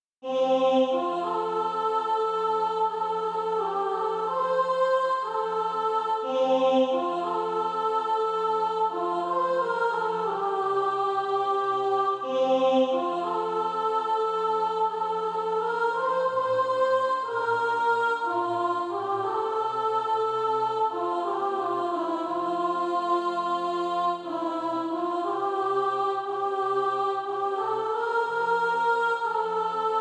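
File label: soprano, SATB Practise Music; Christ Is Born Today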